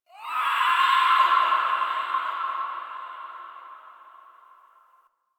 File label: Interior Texture Painful Screech; Warframe; Infested Eris; Ambience